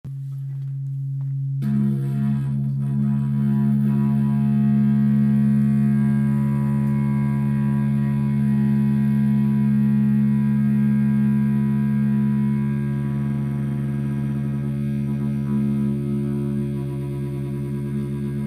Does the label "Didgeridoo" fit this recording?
Yes